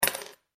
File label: Drop Key; Granny 3; Android